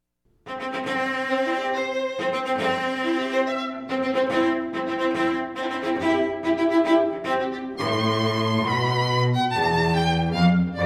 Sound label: Violin Cello